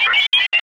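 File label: sphere blips large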